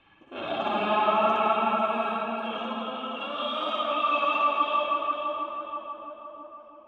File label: a vocal loop